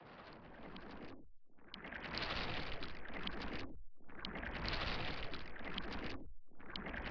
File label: mud